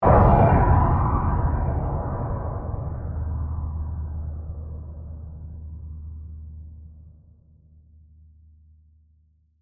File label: Impact Phase Flange, Scifi, Impact, Phase, Flange, Whoosh; Science, Fiction; Sci Fi Sound Effects; BlastWave